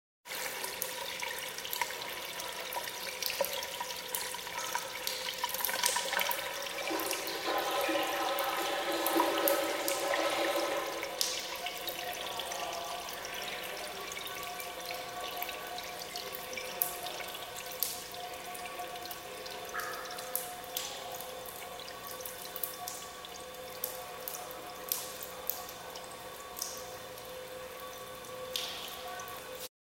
Shower Running Gymnasium Shower Room Shower Running; Household